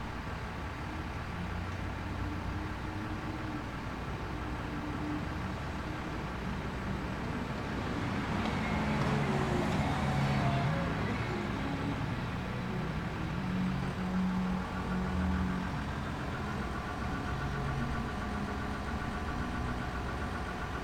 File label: Outdoor Ambience City Truck Idling Cars Passing